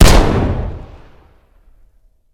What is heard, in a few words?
fire dist int pistol heavy; gmod; arccw uc commons attachments